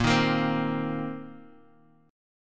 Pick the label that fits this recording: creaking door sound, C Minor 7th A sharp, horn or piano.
C Minor 7th A sharp